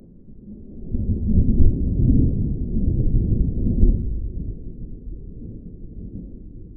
Weather, Thunder, Tropical, Distant Thunder, Booming Thunder; viewfinder